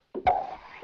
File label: bottle pop